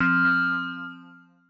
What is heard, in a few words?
synth poly c2